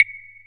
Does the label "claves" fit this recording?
Yes